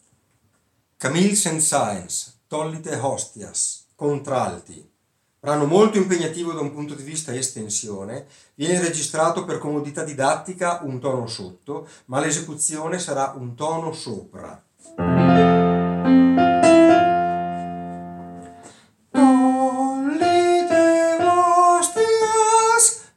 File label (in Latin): Tollite Hostias contralti